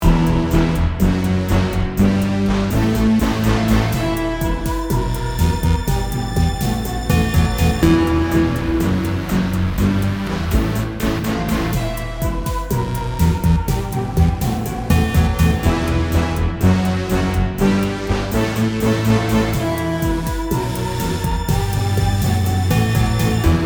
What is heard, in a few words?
A classical instrumentation and synth-sound hybrid hectic sinister tune